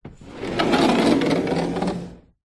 household door internal wood sliding open or close